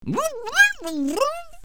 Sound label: axe